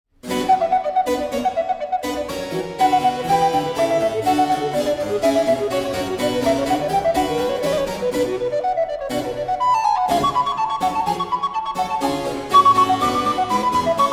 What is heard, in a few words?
recorder sonata in c minor allegro; telemann